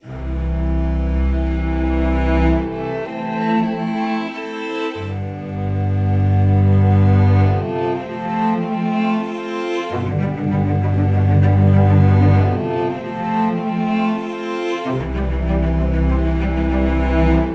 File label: Red Leaves Underscore, Autumn Strings; Narrative Mind